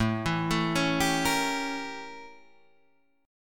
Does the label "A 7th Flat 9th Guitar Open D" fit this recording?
Yes